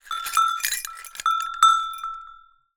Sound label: Cowbell Chain, Percussion